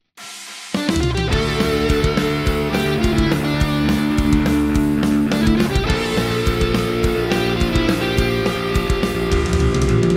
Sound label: 8bit version guitar cover